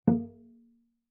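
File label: Cello pizz fortissimo sul C Bb2; MIS Pitches - 2014; Strings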